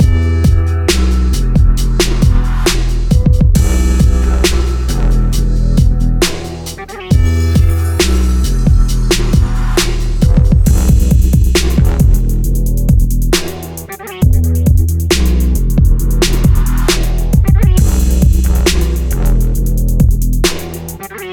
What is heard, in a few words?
fury brass trap sample - em; instrumentals